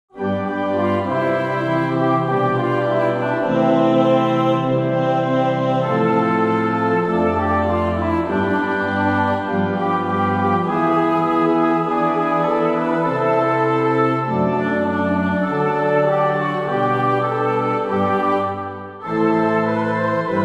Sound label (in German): Orgel